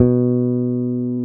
BASSES, Roland

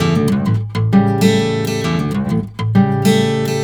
BARI RIFF, Blues Guitar; ILIO; Fretworks

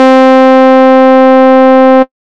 SY SMOOTH; ModelCycles

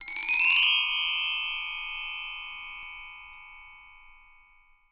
TREE BELLS, Percussion; Voice Crystal - Steve Reid Definitive Percussion

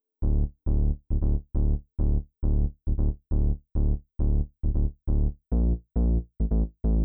136 Sine, 136bpm; Zero-G Trance Inducer; Construction Kits

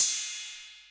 Akai XR-20, Drum Machines, Cymbals

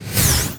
sfx sci fi; Masterbits - Switch FX

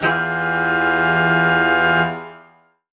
Horn Swell Alt Emajminb6; undercover samples

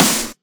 Snares; Vengeance - Essential Clubsounds Vol.4

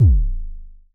Mild Thump Kicks G#0, Vermona Kick Lancet; LookIMadeAThing Kicks; M8; Mild Thump Kicks Samples

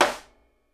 SNARE, Live Snares

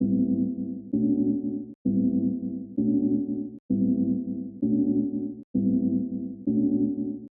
Epian Loop 130; Equipped Music; Loungin House

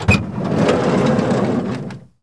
SLIDE DOOR O, CARS

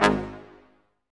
BRS Quintet stac, Symphony Orchestra; Roland LCDP08

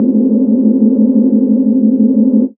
synth, Chillout Synth Atmos

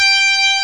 GUITAR, POWER GT

